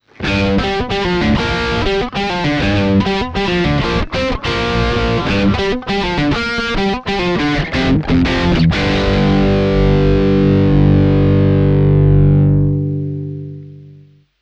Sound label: sansamp rock; behringer vtone